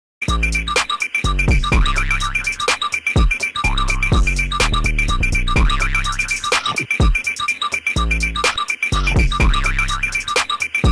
Goofy ahh beat

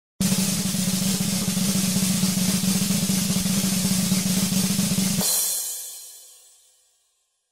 Drum Roll

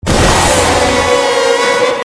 Jumpscare; the lorax movie

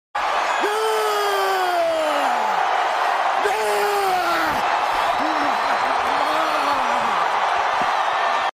Kurt Angle screaming meme

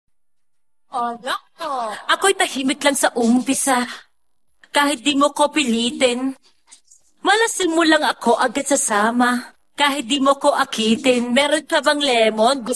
Acapella

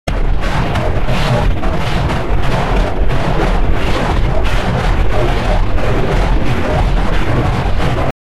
Ambience

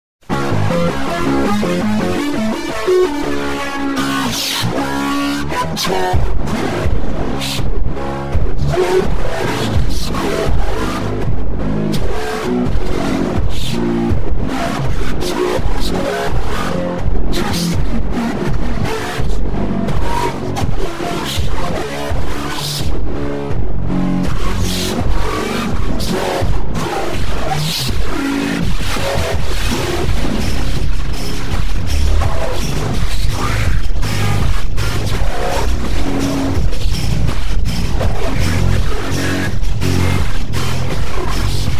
ambience

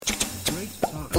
reload; shylily

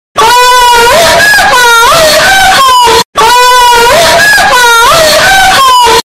moaning earrape

